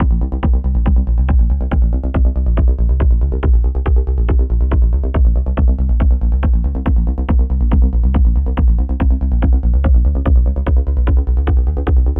Accelerating Rhythm

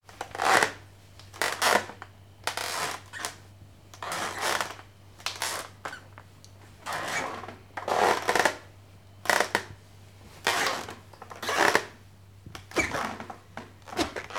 Wooden Floor Crackling Footsteps

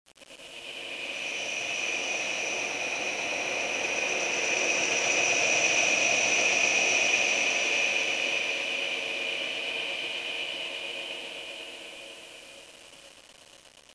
Floating Whoosh Slow

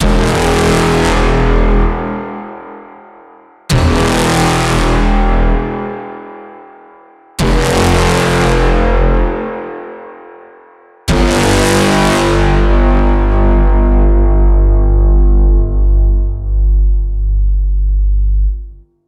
War Horn

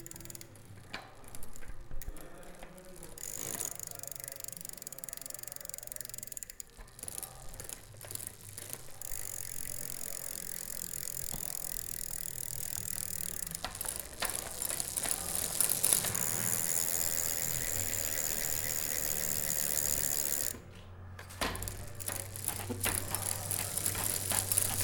Air Pedal

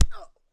Punch

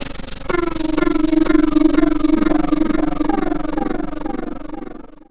Alien

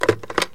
Telephone Pick Up

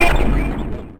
Stab

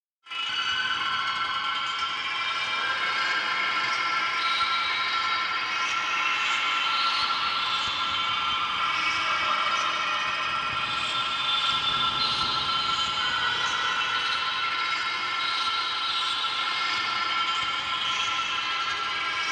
Texture

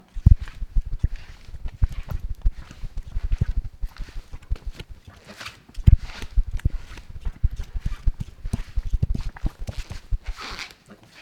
Bird Wings Flapping

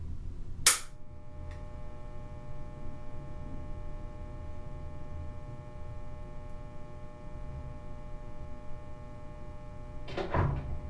Fluorescent Lamp

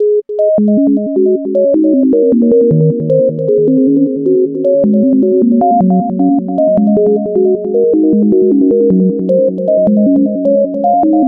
Stars Sines

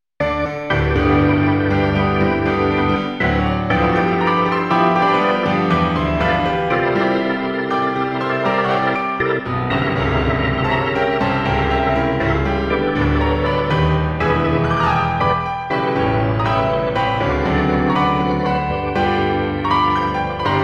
Gospel Intro Traditional Even Brighter Reverbed; Hammerklavier

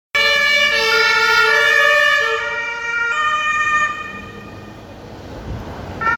German Police Car

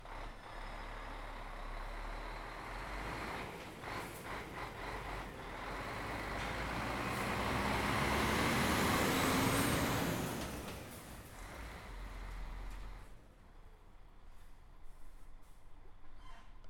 Truck Lkw Itfaiye Kamyon

